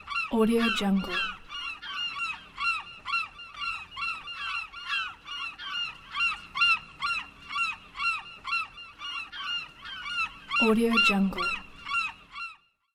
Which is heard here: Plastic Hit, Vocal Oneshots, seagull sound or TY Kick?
seagull sound